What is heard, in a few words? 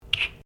Carton Cardboard Cap Plastic Pick Up, milk or juice container; Food; Household; SoundEffects Foundation2